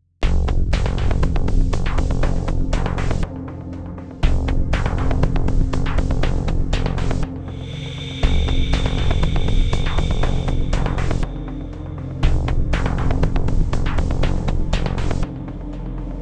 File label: music short suspense theme